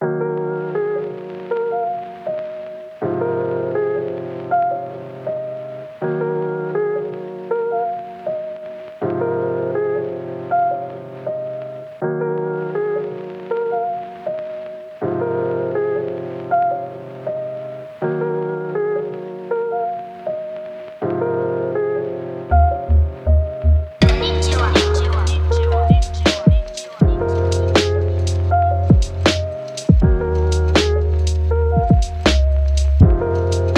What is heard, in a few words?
Lofi Chillhop